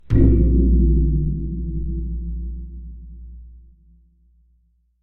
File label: Stingers sting companion; Hand of Fate 2